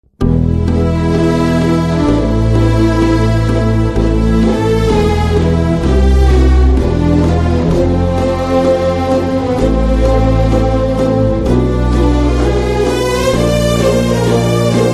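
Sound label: background music Telugu Ringtone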